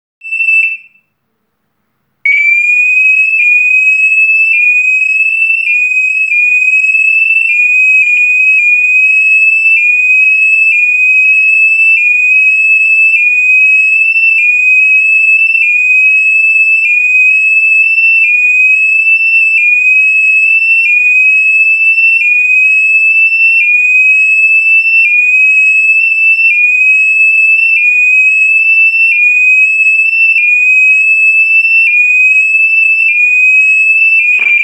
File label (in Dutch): ontruiming old